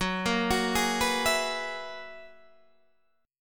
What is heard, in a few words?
Gb 11th, Guitar, DADGAD